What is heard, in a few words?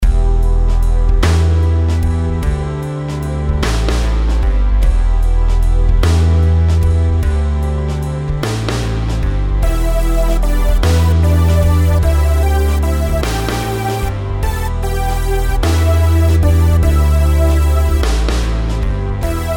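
melodies compound 8s E minor; melody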